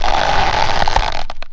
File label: tree; Mafie 1